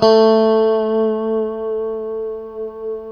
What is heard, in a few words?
A JC120; Spectrasonics; Hans Zimmer Guitars VOL-1; JC-STRAT MF